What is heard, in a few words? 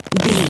foley leather stretch couch chair